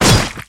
Melee Physical Heavy; Kilta

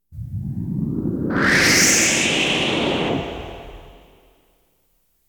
Science Fiction effects, Lasers, Light beams

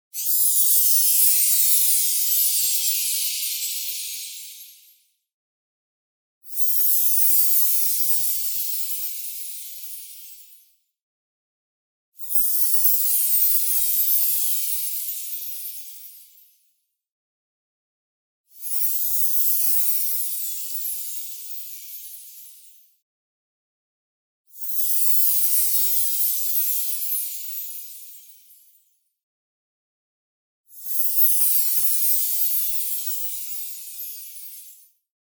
SHIMMER Mirrored Movement; boom library; Anime Essentials

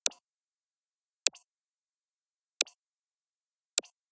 Generic Click Digital

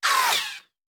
Bullet Pass By; Sound Ideas; Guns

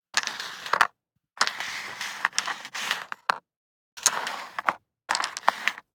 DICE on Cardboard, Slide, Standard, 5 Five Dice; Samples; ArticulatedSounds; DICE Sfx Party (Extended)

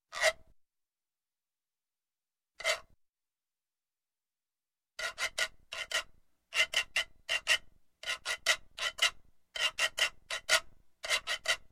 Piano tag, Music; Sound Ideas 2000 Series

